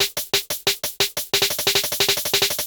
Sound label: Huge Clap; сэмплы битов; хип хоп